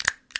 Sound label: stapler